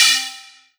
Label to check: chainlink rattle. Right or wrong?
Wrong